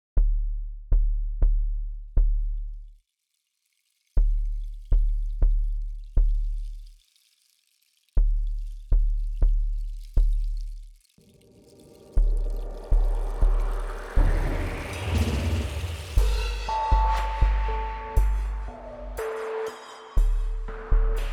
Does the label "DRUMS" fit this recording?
Yes